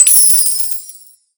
Coin Spawn Medium

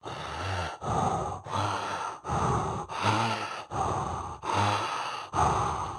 Heavy Breathing; Caffiend

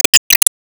Bonus Balloon; disney star smash android ios mobile gamerip 2020

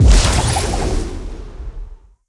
Board Upgrade; subway surfers city sound effects; Android, iOS

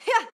Swipe Normal; Going Under; Jackie

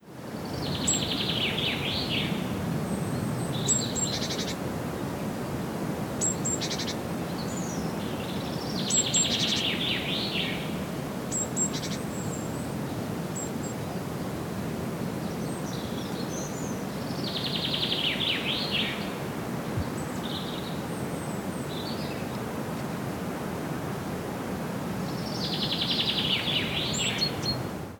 Molokai Forest birds

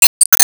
Enemy Pirate Anchor Swingup; disney star smash android ios mobile gamerip 2020

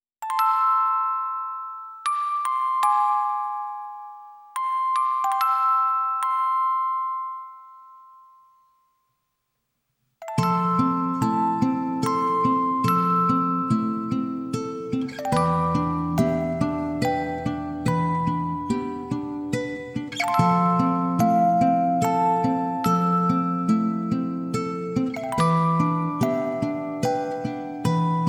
Music box and guitar; Rurouni Kenshin Meiji Kenkaku Romantan

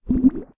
Tofu Wobble; Aporkalypse Pigs of Doom

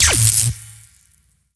Magic Lightning Zap; Skylanders Cloud Patrol; Arcade, iOS, Mobile

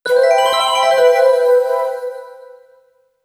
Effect [Roland GS]; Castlevania Chronicles; PS1

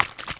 Shake; Gong

